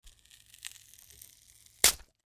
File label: MedicalScientific; Soundfx III